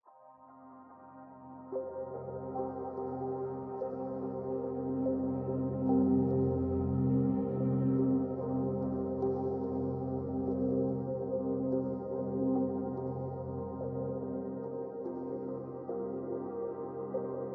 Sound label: ambient; Minetest; VoxeLibre; mcl music